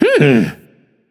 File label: thinking, voicepack; smite; jorm